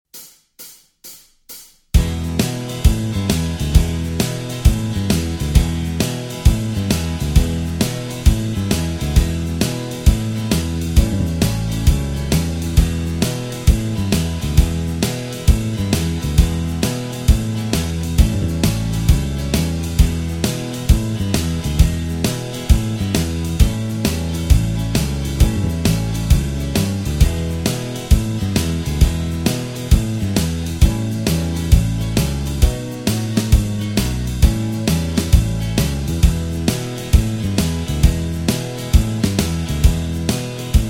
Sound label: E No Lead Guitar, backing tracks